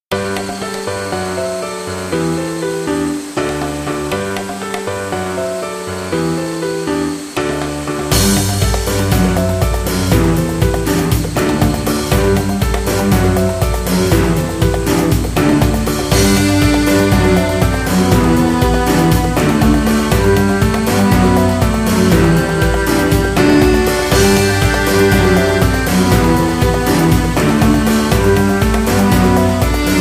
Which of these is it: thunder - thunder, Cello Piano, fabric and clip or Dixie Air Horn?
Cello Piano